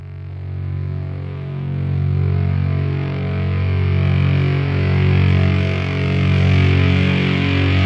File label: distorto guitar fadein